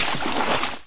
Footstep water light; 1916 der unbekannte krieg gamerip

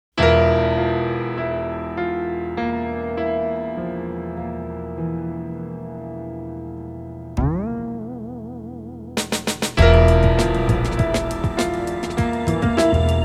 Breakbeats; Yoru ga Kuru Square of the Moon; A Night Comes

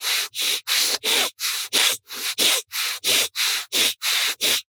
inmate chase; Devour

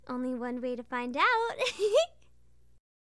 Tickle; SFX Kitchen; Hilzartov; Fairy Size Doesn't Matter

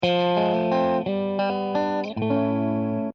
Positive Pos stab gitaar; Outdoors Old

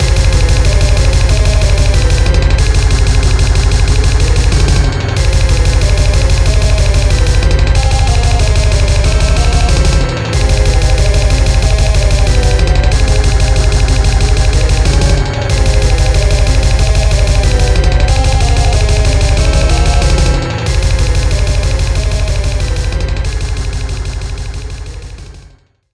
Black Metal; Minit; Jukebox

